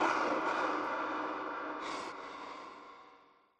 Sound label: Ghost Breath; horror and atmosphere fx; Silent Hill Downpour